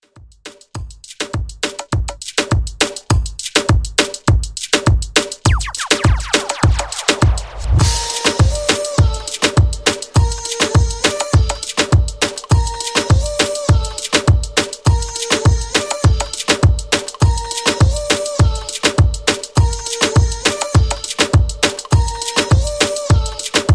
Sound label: Moombah Mixshow 102Bpm